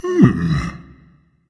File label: thinking berserker; cuchulainn